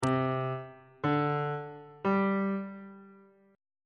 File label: b sharp suspended 2nd triad chord intervals on bass clef